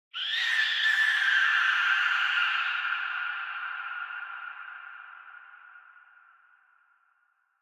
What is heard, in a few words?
ambience bad death whistle; smite; conquest